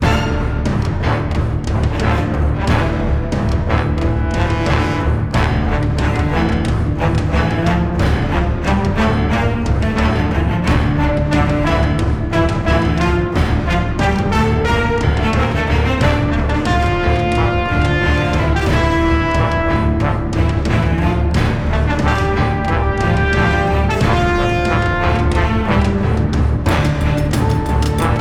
Motivational Orch; Crysis

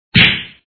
Good Smack; Dad 'n Me

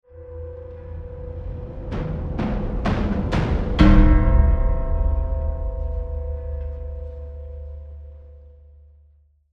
Tension; Match Over

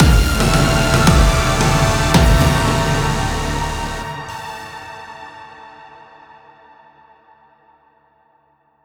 Stinger Smooth; null vector

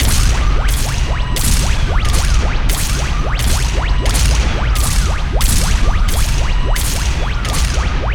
Walking Robots

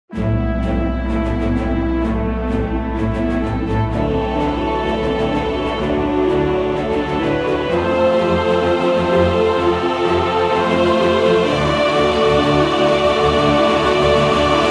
Begin Adventure Music

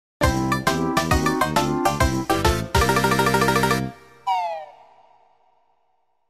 Lose A Life!; Banjo-Tooie

